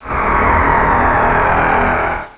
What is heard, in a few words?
double damage; valve; sev powerups